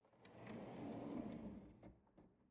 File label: drawer cutlery draw in slow